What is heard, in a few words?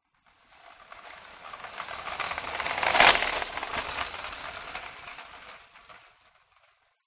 shopping cart metal pass by